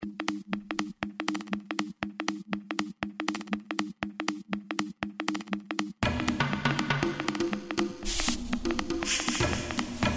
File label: Monophone